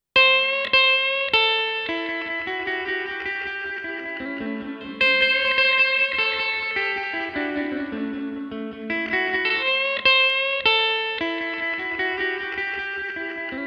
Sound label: guitar, roland sde330; guitar delay